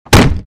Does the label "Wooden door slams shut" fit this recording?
Yes